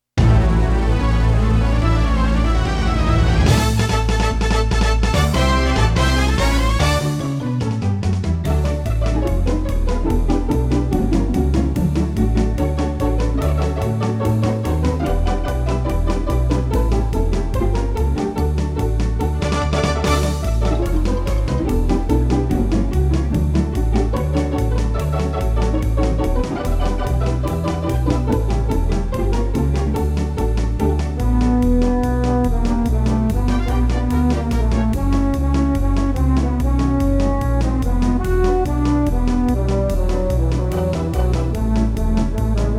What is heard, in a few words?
For Strings, organ